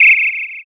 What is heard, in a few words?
whistle short